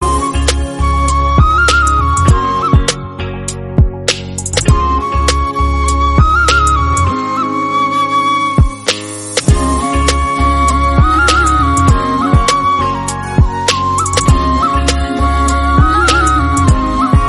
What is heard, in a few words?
Instrumental Flute BGM